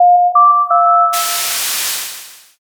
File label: modem